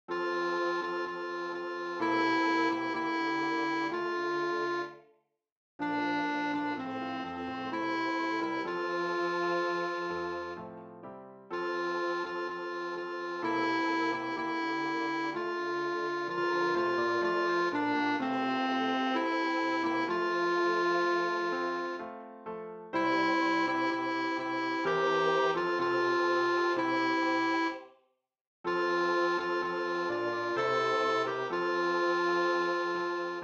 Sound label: alto; Schubert deutsche messe; la messe allemande